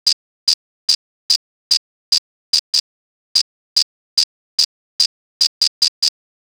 Cabasa 146, Percussion Elements, Abstract World Fusion